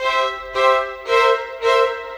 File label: Violins; Sonic Foundry (Sony Creative Software); Orchestral Series VOL-4; Rock and Pop; Rock-Pop 13